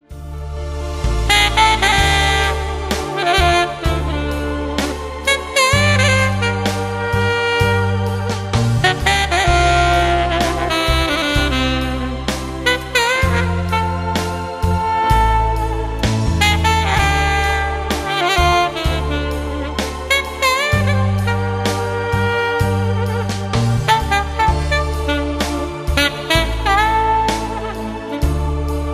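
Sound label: saksofon, saxophone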